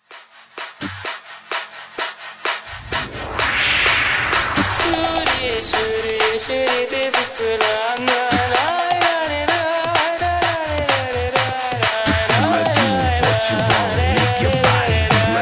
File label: Remix Hype